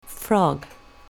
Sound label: frog; wild animals